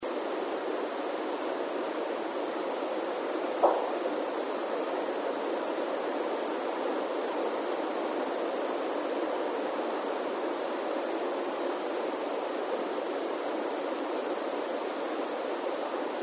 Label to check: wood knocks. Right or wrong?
Right